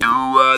Talk Box; E-MU Producer Series Vol. 8 – Platinum Phatt

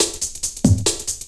LOOP SD; Ueberschall; Drum & Bass Resonance

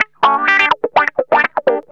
GUITARS 125

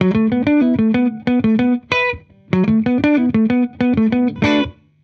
70s Strat, Guitar, 95bpm, B, dusty funk

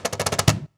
CAJ FILL, cajon fill; Univers Sons - Raricussions

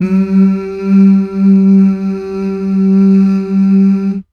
MMHH

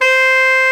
ALTO SAX C5; Greytsounds VOL-1

